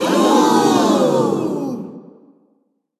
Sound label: Ohhhh; Running Man Jungle; genki